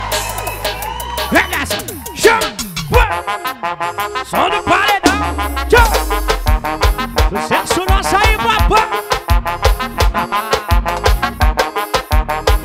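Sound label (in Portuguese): mega funk mix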